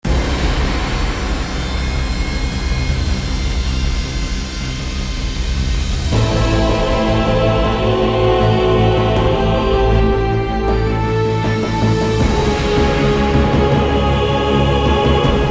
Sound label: panic music